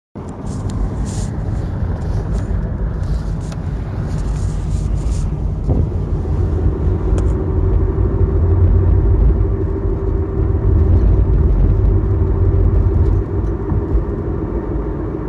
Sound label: front end noise hhr noise